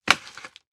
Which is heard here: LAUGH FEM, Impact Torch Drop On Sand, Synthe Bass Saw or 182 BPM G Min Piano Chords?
Impact Torch Drop On Sand